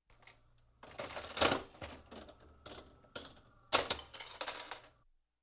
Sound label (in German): spielautomat ziehen - gewinnausschüttung; Gluecksspiel